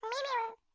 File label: Globe Bird idle